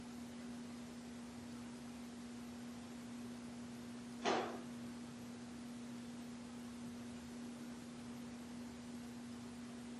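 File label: Ballroom Static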